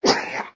gag

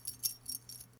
rustling metal

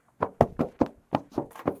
run

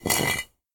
brick scrape

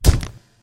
punch

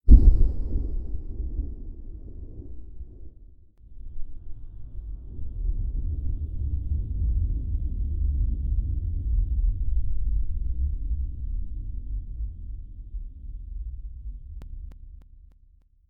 bang

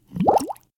bubble

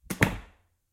door

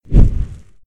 fire woosh

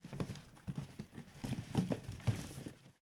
rummage

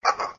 gag

